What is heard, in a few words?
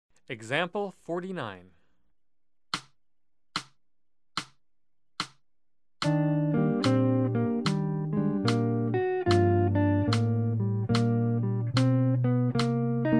Jazz; jue shi ji ta